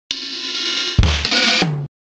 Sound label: yamaha pss26 drum waverom